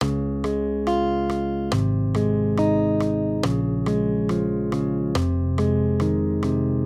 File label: maj triads; accompaniment